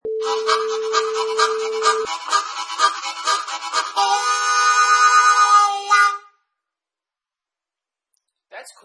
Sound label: Harmonica Train Riff